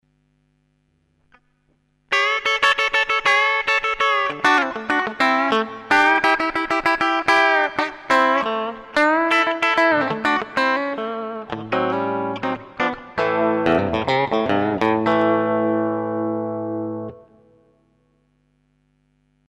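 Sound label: bridge, guitar pickups; Telecaster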